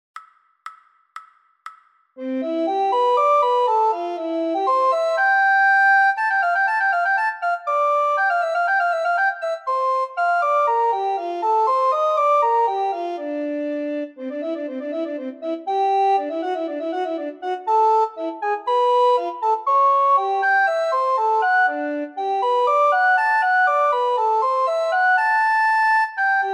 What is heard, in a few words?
descant, recorder